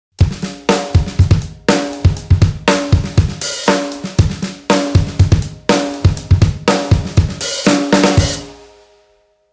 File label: Kit All Mid with AMB